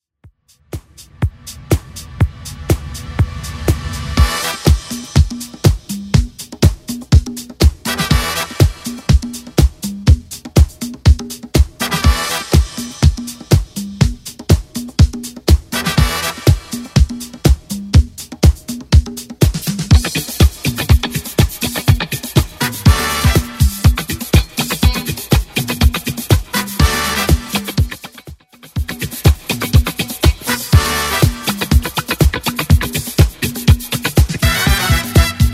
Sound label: 70s 122bpm; Redrum